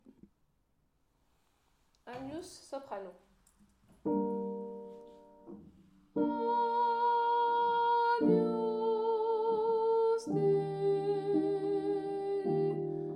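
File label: agnus soprano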